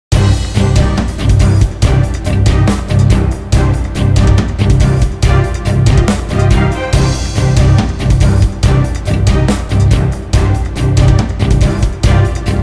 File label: Guitar Heavy Version